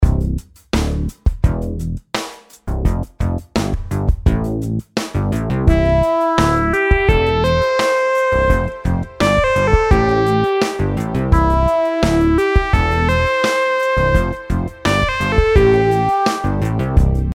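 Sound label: melodies simple 8s triplets C major; melody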